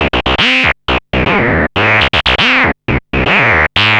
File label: Analoop, Industrial